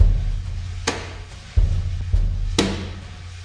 139 bpm, soft n mushy brush hitter, drum loops and breaks